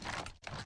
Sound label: Equip; Valorant; odin